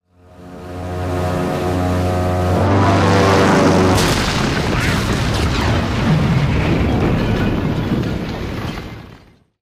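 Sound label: Plane falling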